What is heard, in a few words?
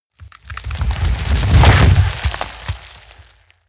ice medium crash